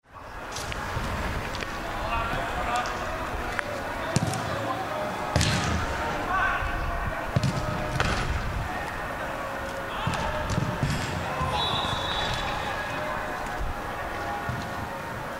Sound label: football practice, college; suffolk college